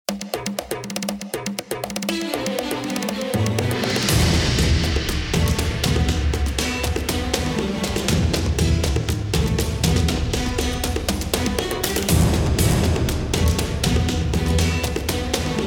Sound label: fantasy pagan medieval cinematic epic war battle; Music; Combat; TheVengeance; Unity2D